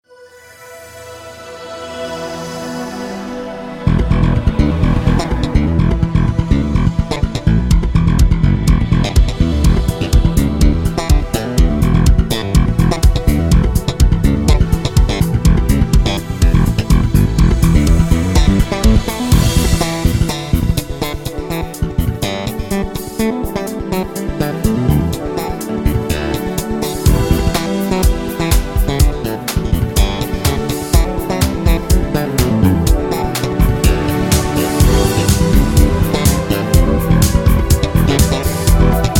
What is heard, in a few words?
Slap Bass; J-Slap Bass; Demosong; Scarbee